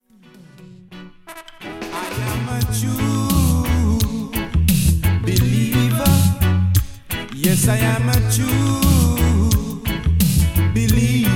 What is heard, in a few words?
HEARTBEAT